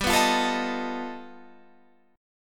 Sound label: G Augmented 9th, Guitar, Standard